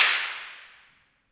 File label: impulse